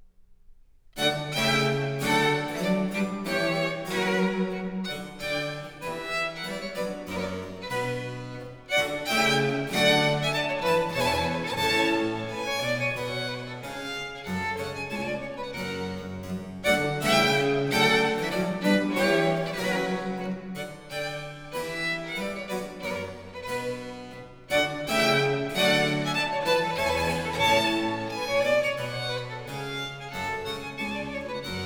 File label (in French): Pièces de violes, Suite in C Minor; Book 3, No. 23-32; Nicola Matteis; Ayres for the Violin; Quatrième Livre (Paris 1717)